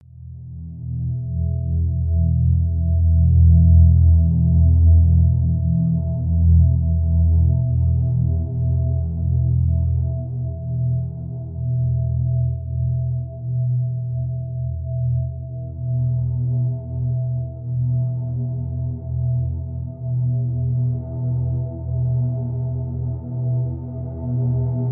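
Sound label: Drone Transducer (E); Brand X Music; Brand X Music Catalogue - Editor's Essentials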